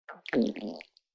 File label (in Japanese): お腹のぎゅるぎゅる音